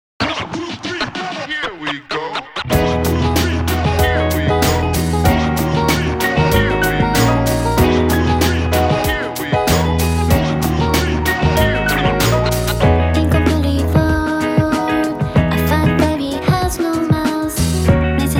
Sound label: Jazzy Hip-Hop Remix; pop n music 19 tune street